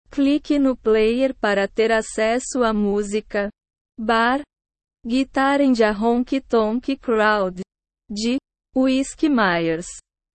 bar guitar and a honky tonk crowd

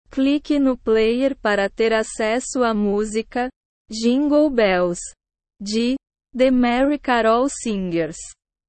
jingle bells

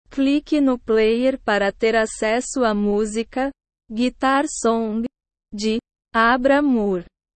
guitar song

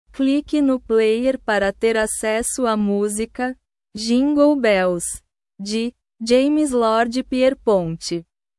jingle bells

